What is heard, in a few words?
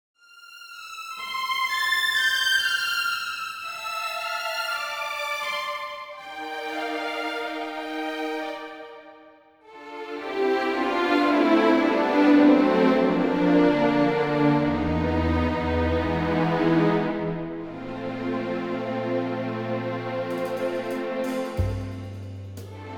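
orchestra G; key - G - vocal range - D to D#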